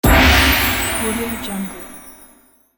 china gong selection medium

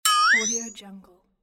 cartoon flexatone comedy accent

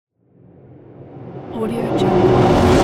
short film riser; icebergsounds

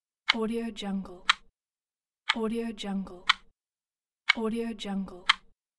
ticking clock alarm

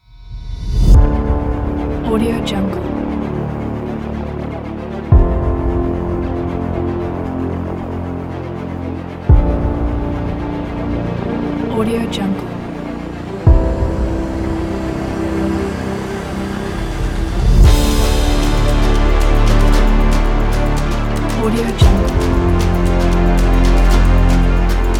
background motivational trailer; madbirdprod

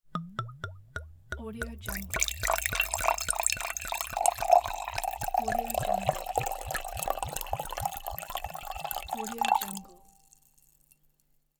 water pouring

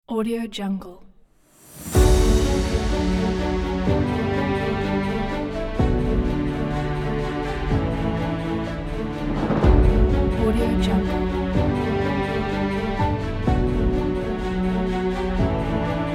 epic inspiring

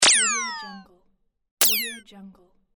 blaster

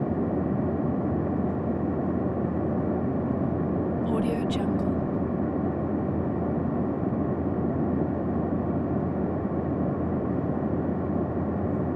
passenger jet interior loop

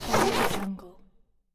large styrofoam block squeak

